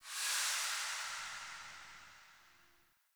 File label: Shrine Activate; Objects